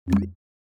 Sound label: format, audio cue; gitlab-vscode